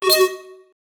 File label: ui sound for the